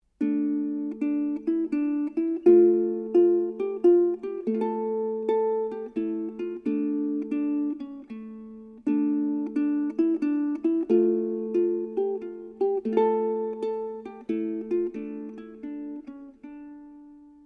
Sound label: Ukulele